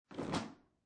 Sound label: Towel Wet Drop Onto Floor, Household, Towel, Wet, Dropped Onto Floor; Bathroom